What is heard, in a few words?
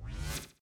respawn